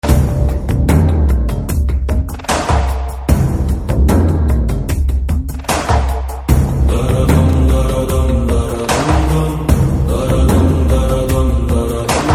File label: Hindi BGM Theme; RRR